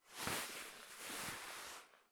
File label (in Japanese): 布団をかぶる